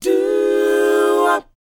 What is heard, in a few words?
DOWOP A#, Vocal R&B DOO WOP; ILIO; Vocal Planet